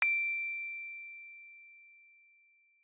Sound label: Gamelan Chromatic One Hits Short D6; gravityharps; timbrebox